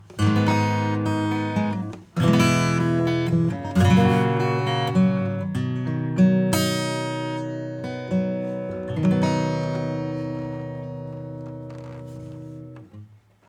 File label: acoustic bypass; FET